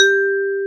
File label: G-3, VIBRAPHONE; AKAI S-Series CD-ROM Sound Library VOL-7